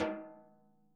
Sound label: SNARE, Interactive Drum Kits